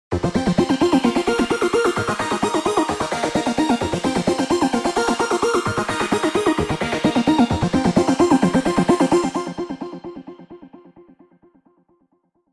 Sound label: Arp Synth - Spatial Guitar; Spatifier